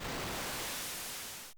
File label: Wave Crash